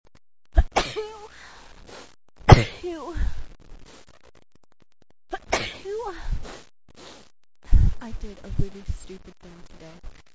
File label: cold dust misery, female